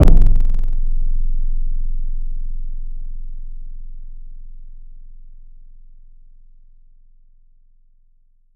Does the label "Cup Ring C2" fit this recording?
Yes